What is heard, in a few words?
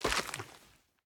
Single Step Footstep, Dirt & Gravel; PMSFX; STEPS Dirt & Gravel